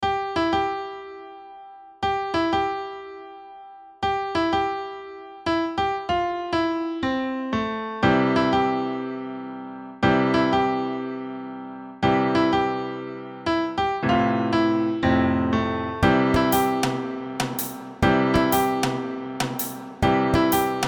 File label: Happy 120BPM